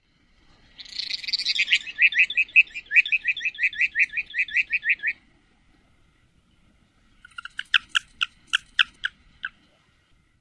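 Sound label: Mountain Plover, flight song and calls; WY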